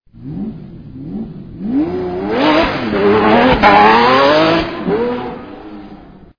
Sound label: gtr boxes; Lambo